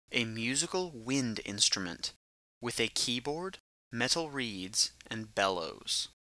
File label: accordion